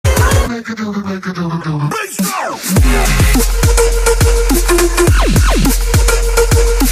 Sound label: win sound; pubg